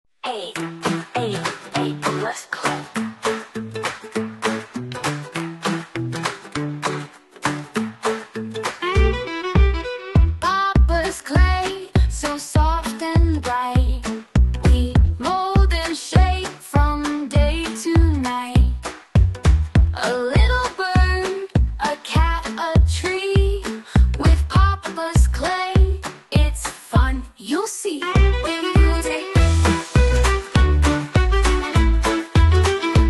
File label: jingle; Papas Clay; clay world; children fairytale podcast